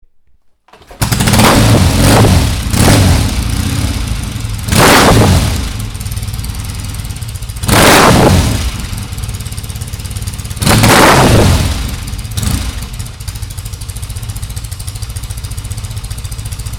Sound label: northon 850 commando